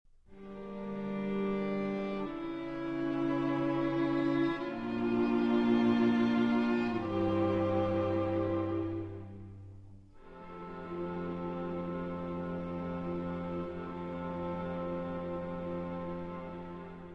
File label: String Quartet